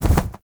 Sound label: Owl Idle To Pre Hover; Warframe; Duviri; Animals